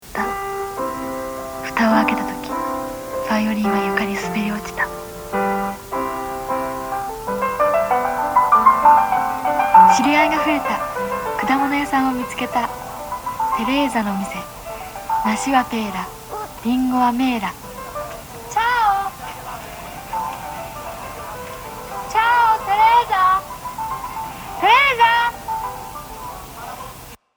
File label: Violin